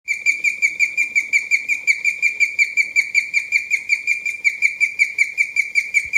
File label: Chirping, guinea pigs; happy guinea pigs